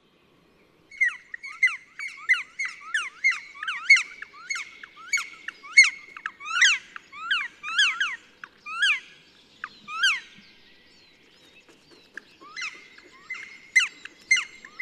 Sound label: dryocopus martius calling drumming